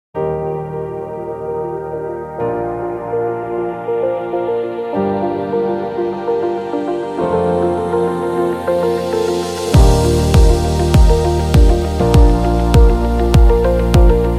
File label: Corporate Technology; strategy